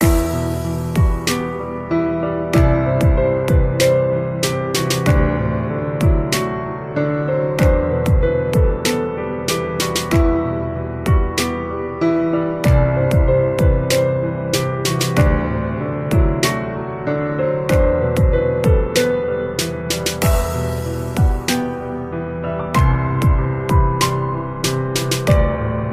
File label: piano hindi ringtone; bollywood ringtones